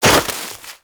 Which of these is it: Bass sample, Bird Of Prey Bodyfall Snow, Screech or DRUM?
Bird Of Prey Bodyfall Snow